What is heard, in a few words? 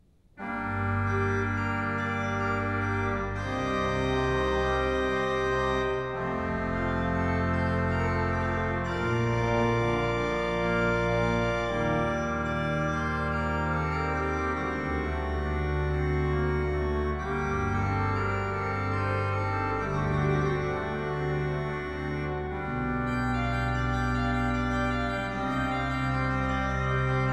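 Prelude, organ; J. S. Bach; Piet Kee Plays Bach, Volume 3; Eight (short) Preludes and Fuges; No. 6 in G minor, BWV 558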